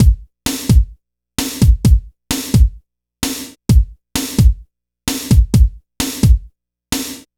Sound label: 80s Funk Beat, Drumloops; Dr. Fink's Funk Factory